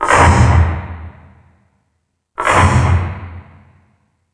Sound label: Industry, Drum Machines E-MU Pro-Cussion